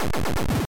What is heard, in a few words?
Explosion